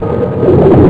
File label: valves; U-Boat 1.5.3